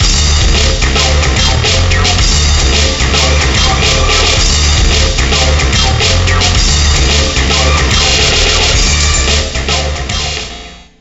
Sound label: Ditty; NBA ShootOut 2002; PS1